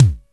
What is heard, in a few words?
EL KICK